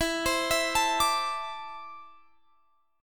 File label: E 7th Suspended 4th Sharp 5th, Guitar, Standard; E7sus4#5 arpeggiated; x,19,22,21,22,22; JGuitar